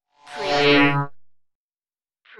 drone slide beam

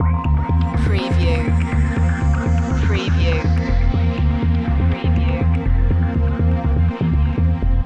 tough trek loop

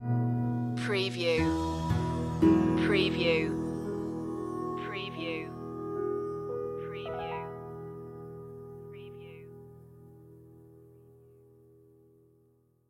beauty and calm

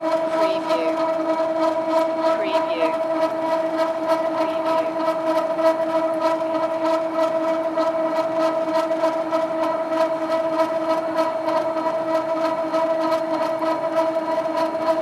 conveyer belt

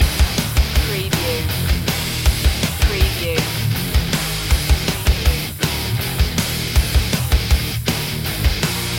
firestorm loop

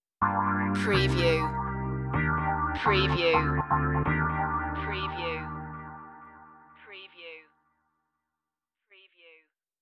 beach side stinger

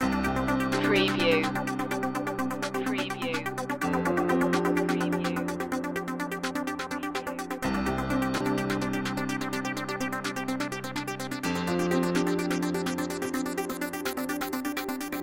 ambition score loop

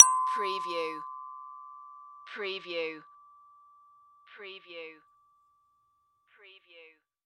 Bell Ring Chime; sound-effects-bible

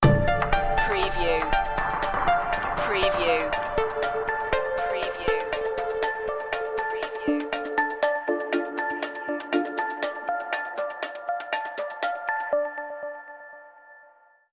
hypnotica sting

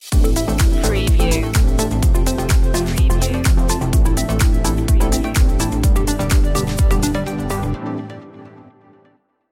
positive direction stinger

